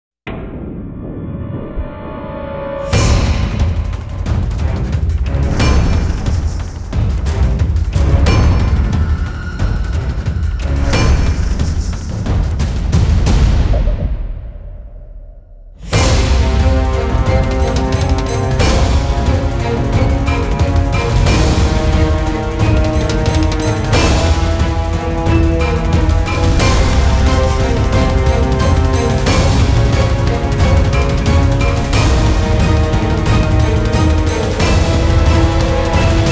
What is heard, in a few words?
dark truth instrumental